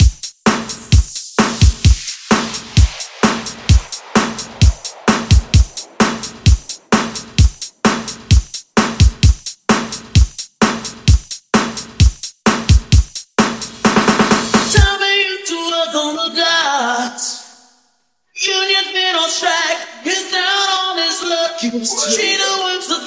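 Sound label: Upbeat acapella Intro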